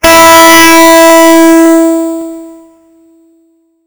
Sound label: high e, guitar single notes